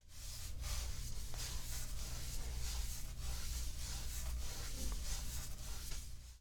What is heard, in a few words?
Sweeping Broom